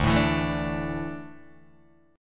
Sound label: D Suspended 2nd D down Guitar Open D